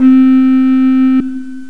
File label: Recorder; Software Explosion 2 - Virtual Computer Pets; yamaha xg; pipe